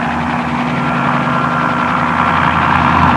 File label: CHOPPER; trasporti